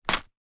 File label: small plastic flag place down on floor; foley